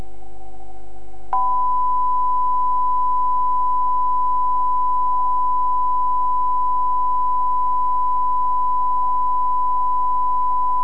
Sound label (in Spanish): carton